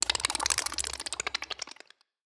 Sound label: Alien Tiny, Monster Sounds; TheDeclineOfWarriors; Monster Sounds Volume II